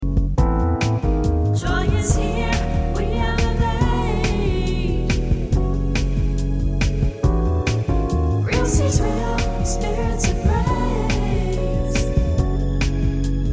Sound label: We Elevate (TV Track all drums)